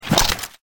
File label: take gun